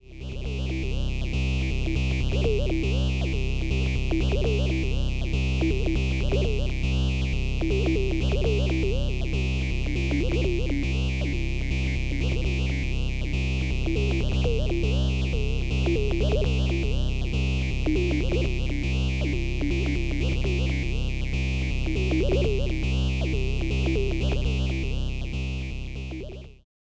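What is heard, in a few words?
Rossum Electro Music Morpheus Flute Breath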